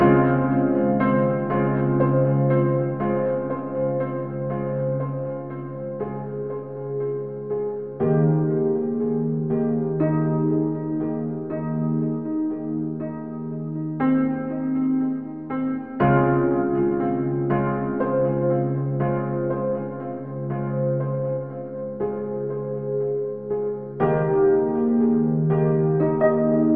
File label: loop slow